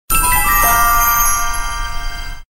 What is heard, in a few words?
Twinkle